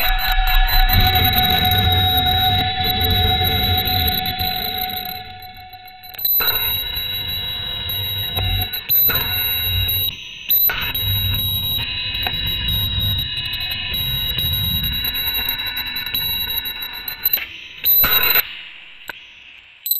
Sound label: intense ascending mechani, electrical